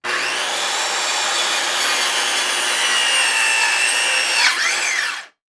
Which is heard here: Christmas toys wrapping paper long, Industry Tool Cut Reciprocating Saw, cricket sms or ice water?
Industry Tool Cut Reciprocating Saw